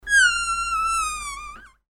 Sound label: nano fart